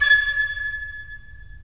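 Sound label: FL, flute; LA Composer vol. 3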